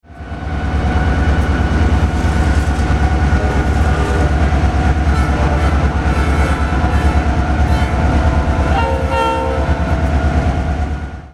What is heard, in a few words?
CSX 3 way horns, train horns